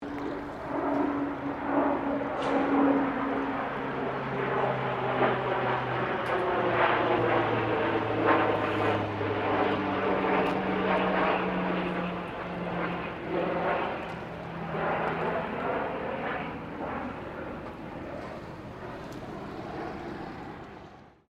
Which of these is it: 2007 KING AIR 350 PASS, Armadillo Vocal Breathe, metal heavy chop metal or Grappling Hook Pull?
2007 KING AIR 350 PASS